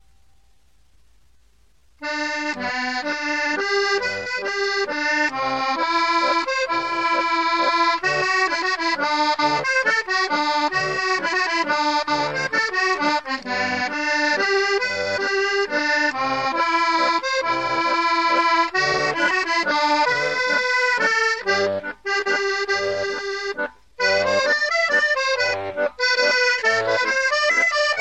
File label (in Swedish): Norska valsen